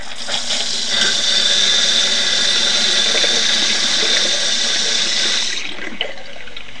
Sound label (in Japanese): mizu